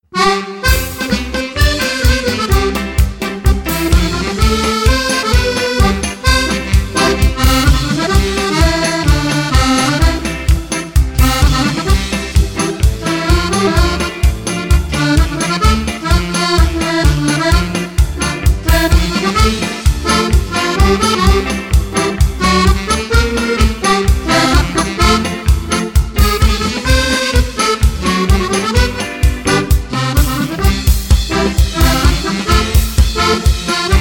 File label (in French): la marche des amis du musette